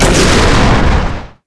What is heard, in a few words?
boom